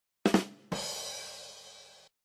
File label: rim shot